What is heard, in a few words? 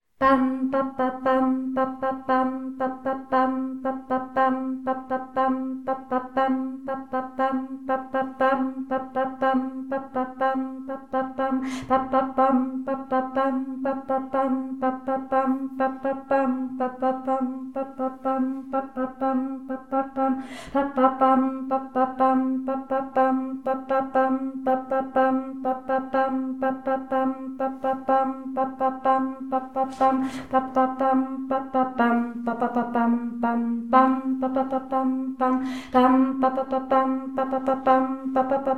tenor; Tenor 2; Farnosť Letanovce